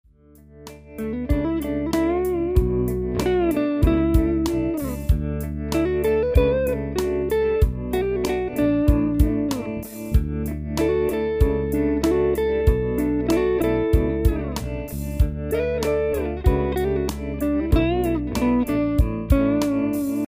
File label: Pop